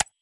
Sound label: Menu Dropdown; Custom UI Sounds; Insurgency Sandstorm